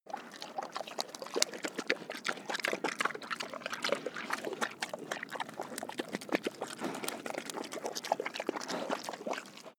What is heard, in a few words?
pig eat